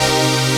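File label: synth A#, STAB, House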